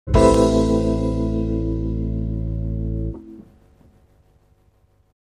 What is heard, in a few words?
Wurlidream